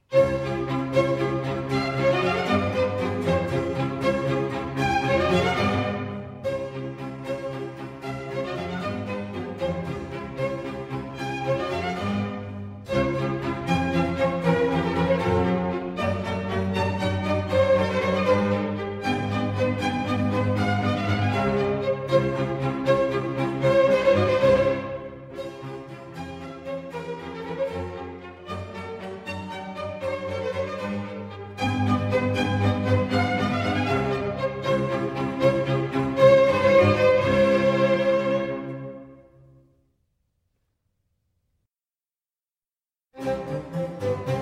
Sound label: Baroque Music for Studying Brain Power